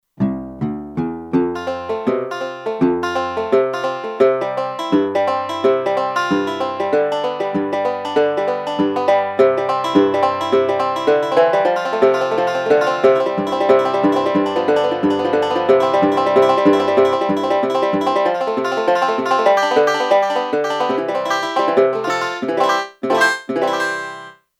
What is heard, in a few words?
Kurzweil K2500X; Factory Programs